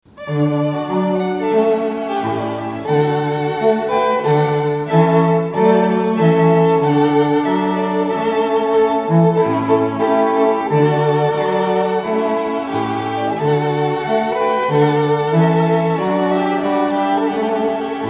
Trumpet Voluntary, String Quartet

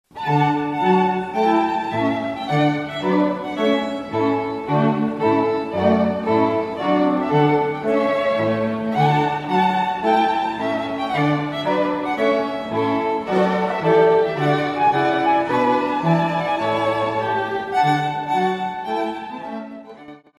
Trumpet Tune; Purcell

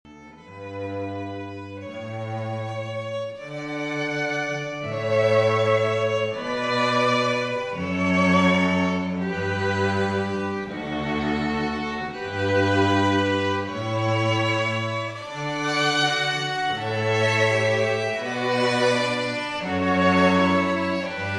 Pachelbel Canon, String Quartet

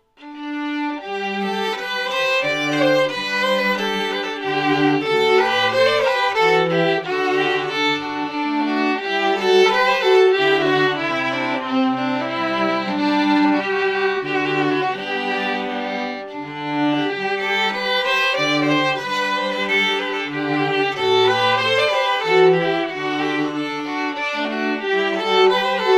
Fiddle Ash Grove, string quartet

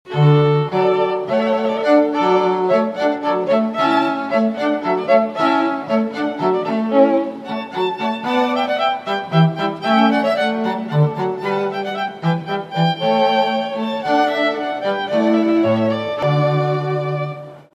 Water Music Finale, String Quartet; Finale to Water Music; Handel

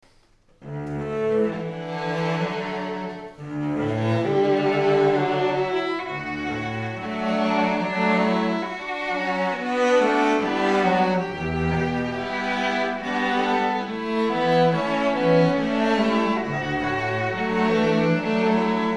Lullaby, string quartet; Hofeldt

